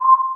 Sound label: game blip